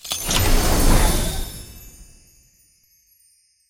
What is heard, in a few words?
wild Activation; wilderland